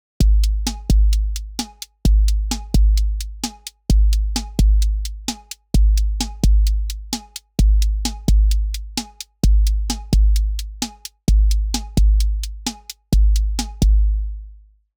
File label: Drum machine